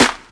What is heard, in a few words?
focused steel snare drum sample g sharp key